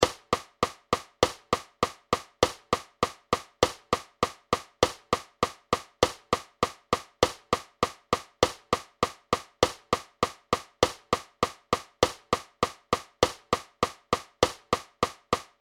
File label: beat